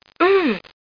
Mhhh; Valentina e la altre di Guido Crepax

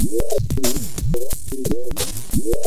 BACK BT, Hip Hop, Backbts; LiveAct Pool

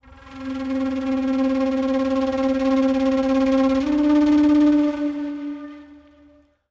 flute, ethno; eJay

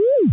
splash

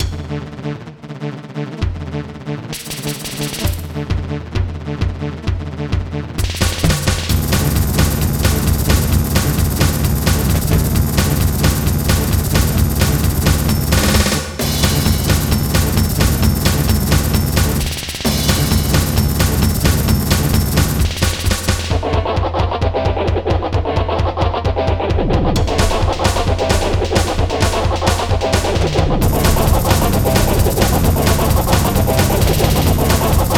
TECHNO; battle

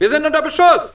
Zombie alert; PC Gamer IT CD 46; HALFLIFE; Gateway

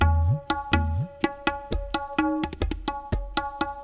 PERCLOOP ETHNO 125; eJay

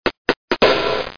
cymbal; MUSIC; FSSD SoundEdit Mac Sound

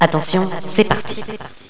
kit; Villagina; ecole

